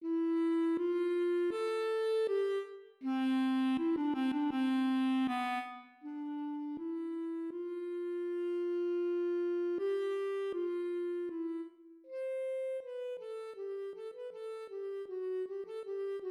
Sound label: for low woodwinds; Bona 79